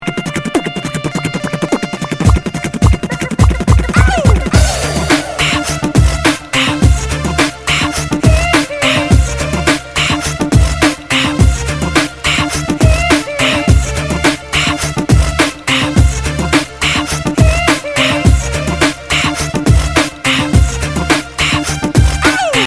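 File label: beat box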